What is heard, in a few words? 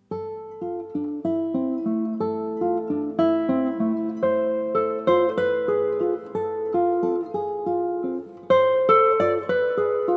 Prelude